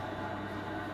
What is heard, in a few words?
reel Spin